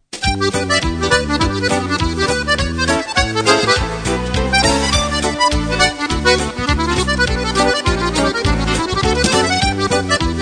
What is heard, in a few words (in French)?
accordeon rag